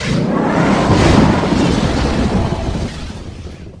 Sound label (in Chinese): 就绪; 红色警戒2; 超级武器音效; 天气控制仪